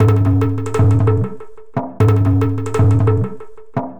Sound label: Frame Drum Beat, Acoustic Beats; Sonic Foundry (Sony Creative Software); Myths of Technology